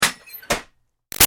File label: Ironing Board Metal Fold Up Screech; Miscellaneous Household